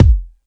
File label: steel kick drum g key